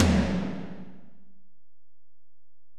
Tom, Alesis DM-PRO

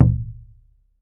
PUEBLO DR, American Natives; E-MU Formula 4000 Series Vol. 4; Earth Tones

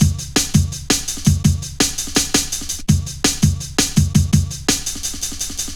Fat Rolla, Jungle Drum Loops 166.5

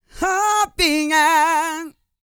GOSPEL; Vocal Planet Vol1 Gospel

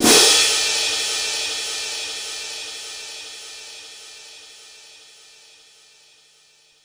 Cym Crash Orch, Korg 05R-W Korg Cymbals; 300 Drum Machines

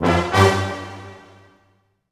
brass accent; Orchestral Loops

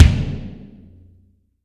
BD, Real Mega Drums

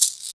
SHAK, Ragga & Dub Reggae; USB Soundscan vol.27; AKAI

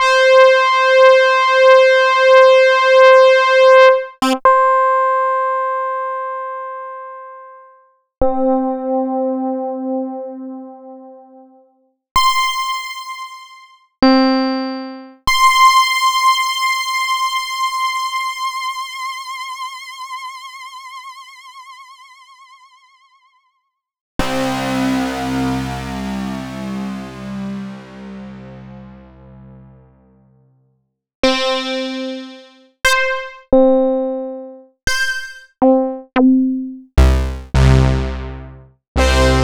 Oberheim OB-Xa Keys

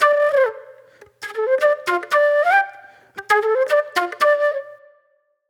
Flute, Disco

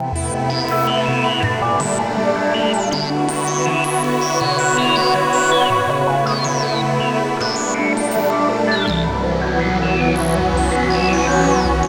TechnoFusion, Keyboards

